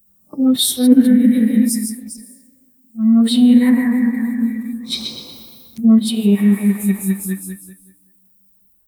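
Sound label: a ghostly woman frantically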